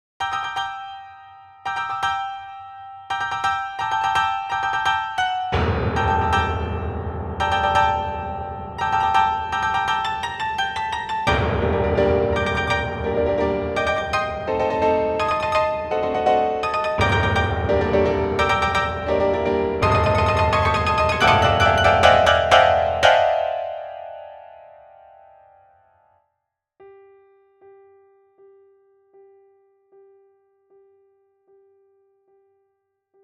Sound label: Mini Grand, piano